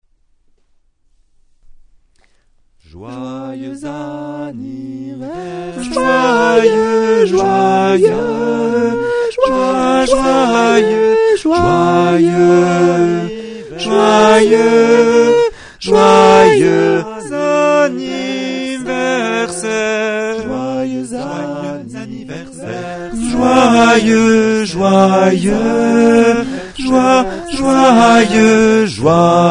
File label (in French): joyeux anniversaire soprane